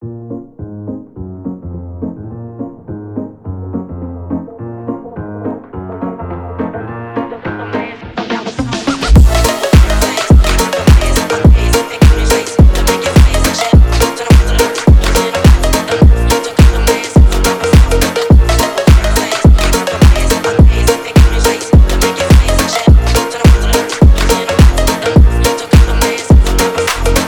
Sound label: swing phonk